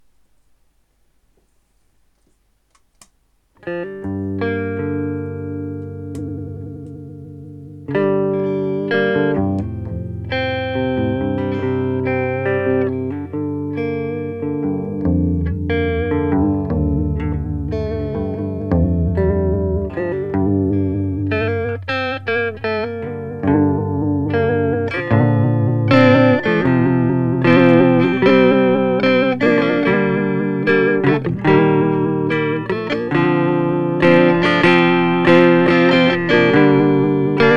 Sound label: vibrato